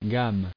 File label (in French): gamme